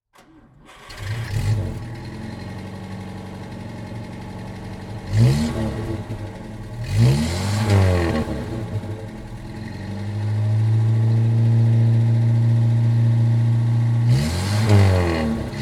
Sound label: Opel Rekord Ascona